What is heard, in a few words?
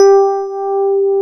TX 802